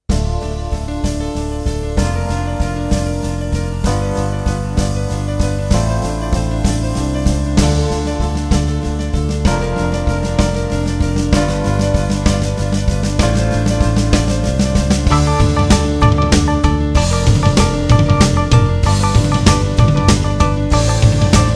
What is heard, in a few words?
Bluesy night, drums play-along